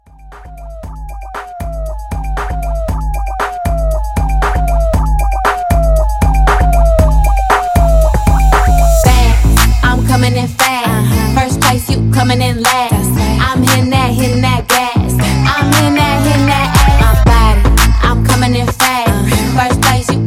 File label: Fast Motion DJ Intro Outro Drum 117